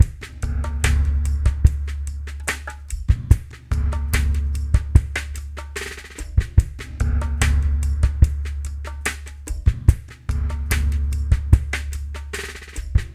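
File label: BOLERINO, LOFI MIX; Spectrasonics; Liquid Grooves 1 & 2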